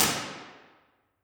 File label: IR Church Schellingwoude